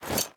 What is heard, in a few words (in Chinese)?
装备铁质盔甲声